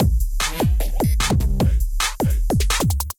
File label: Kit groove 150 bpm; Clubber Kit